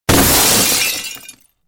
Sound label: crash glass